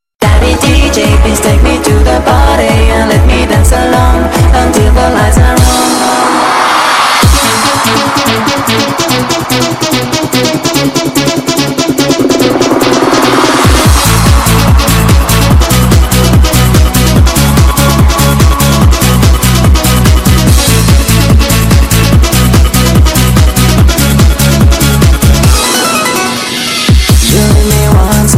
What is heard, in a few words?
Music D J